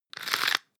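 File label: floss pull out fast, Bathroom; haus-garten; badezimmer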